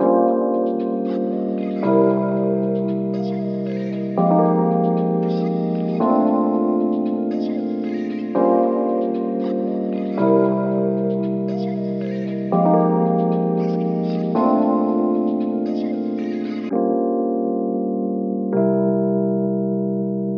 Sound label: 115 BPM F Min, Vintage & RnB Melodies; Lovin U; Cymatics